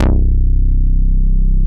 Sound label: Synth Bass, Roland